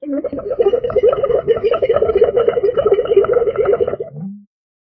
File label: high pitched bubbly gurgl; humorous